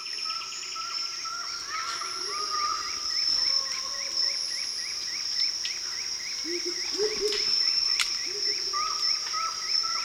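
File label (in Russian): венесуэльские леса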